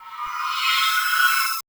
SPOOKS, SPIRAL WINDS; Spectrasonic Distorted Reality 1

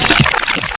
backscratch, scratches; Zero-G; Freak Beats

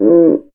CUICA; ILIO

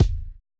kick, Roland MC-909

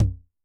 Tom, Yamaha DTXpress II

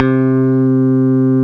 El Bass C4, Fairlight CMI IIe

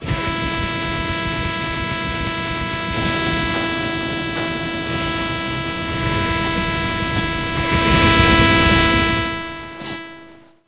FOODTECH, MACHINES; USB Soundscan vol.33; AKAI; Disjointed Textures

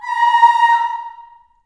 A#4 child AH, choir; CHILD AHS

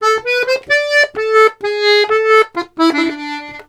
Accordions; USB Soundscan vol.40; AKAI

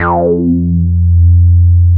F3, Bass; E-MU Producer Series Vol. 6 – Analogia Project (CD 1); Boom da Bass 2

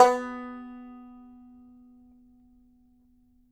Banjo B3 R; Sampleheads - Whole Lotta Country 1 & 2

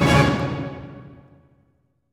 orch sting; musicradar; cartoon caper

